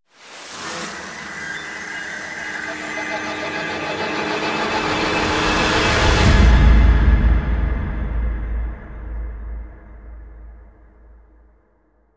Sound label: Lab Chair Shock